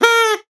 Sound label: Duck call, cartoon Foley Fx